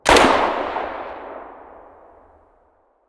muzzle mono distant, weapons

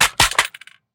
riffle drop gravel; STALKER; IWP

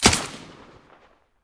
aug silencer mono, weapons; STALKER; IWP

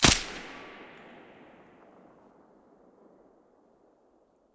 silencer mono

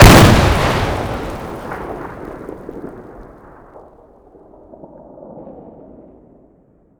grenade explosion

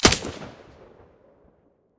aug silencer stereo, weapons; STALKER; IWP

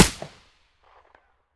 aps silencer mono; STALKER; IWP

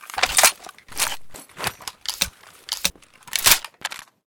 mp43 ammo change, weapons

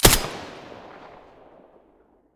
m4a1 silencer stereo, weapons

